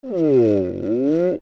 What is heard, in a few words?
Ohrr; Goron; Zelda; OOT